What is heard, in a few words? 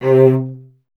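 strings cello C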